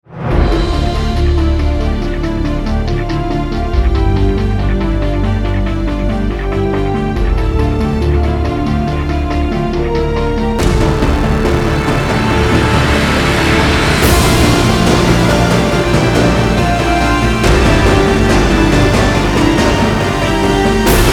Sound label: No Choir